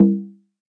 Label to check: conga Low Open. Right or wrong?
Right